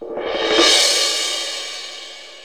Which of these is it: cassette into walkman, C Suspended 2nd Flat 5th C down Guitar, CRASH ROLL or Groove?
CRASH ROLL